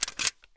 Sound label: m9 mag in, weapons pistol beretta92fs; garrysmod